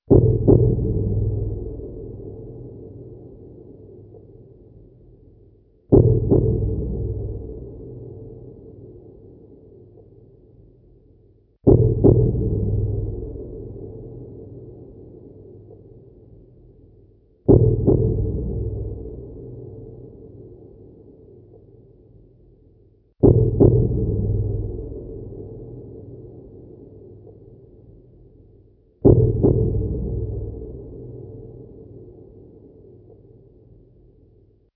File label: ambience